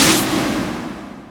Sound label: curse damage; weapons; dota; furion